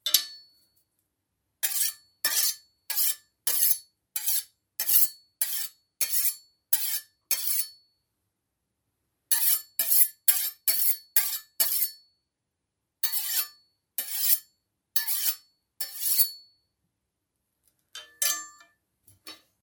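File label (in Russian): три варианта движения нож об нож и в конце нож кладут; Звук ножа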